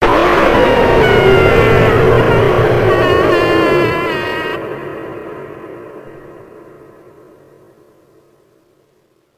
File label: jumpscare; five nights at stellas 4